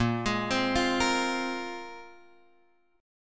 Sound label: Bb 7th Suspended 2nd, Guitar, Open F, chord; Bb7sus2; arpeggiated; x,5,8,7,8,5; JGuitar